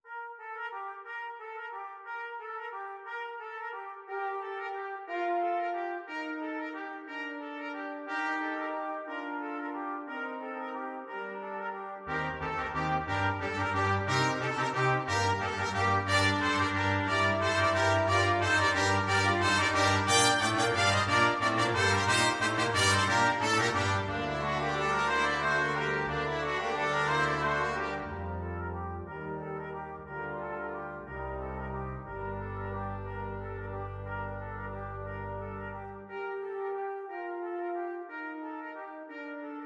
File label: carol of the bells, brass quintet